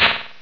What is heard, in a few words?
THROW